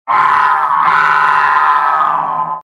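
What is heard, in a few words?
pyro pain critical death; vo; tf2; mvm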